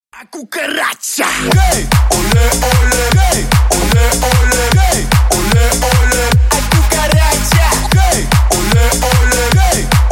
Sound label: rave cucaracha